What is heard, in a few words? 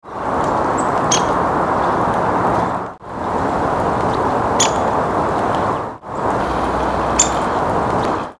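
Downy Woodpecker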